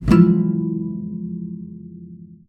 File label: SPINET; Xsample VOL-7